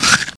chomp hi, voice; Web Clip Empire 150,000

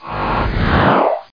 power; morecraft for Warcraft 2

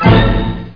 chord

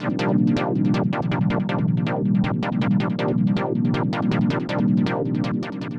SYNTH, Ambient, Keys; eJay

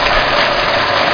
ski; NewSurfs

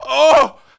WELDER CRAWLING; Messiah

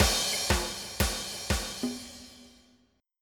reggae

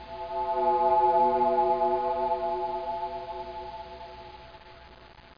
Tube Bell

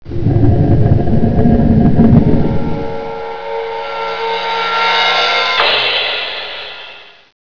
Sarc lid open & cymbal; pyramid

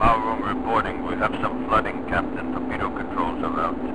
torp Controls; U-Boat 1.5.3

